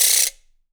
RATCHET; Big Fish Audio; Ross Garfield Drums VOL-2

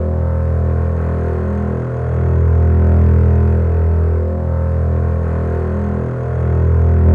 Contrabasses, E0; Best Service ProSamples vol.51 - Classic Orchestra 2

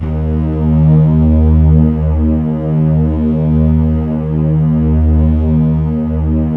Keypad; Standard Instr; Keypad 2

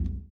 surdo; ILIO; Ethno Techno

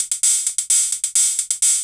drum 130 hihat, Techno Trance, Drumloops

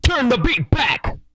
BEAT BACK, R&B Dance, HIP-HOP, Vocal

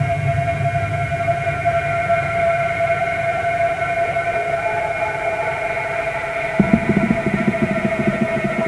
Distant stations; Sonic Foundry (Sony Creative Software) - Hip-Hop Street Beats Construction Kit

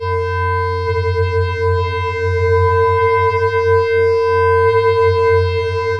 PIPE VIBE; AKAI Anime Sound FX; ATMOS

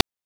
PITCHED NOISE; NES DPCM; MIDINES

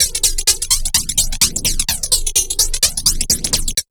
127 DRM, Fresh Disco House

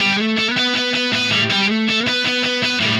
Happy Pub Guitar; Sonic Foundry (Sony Creative Software); Indie Rock Anthems; Session 05 - Happy Pub

